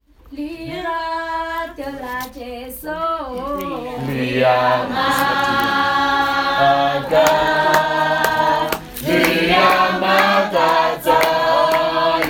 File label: Cottage Ministry Singing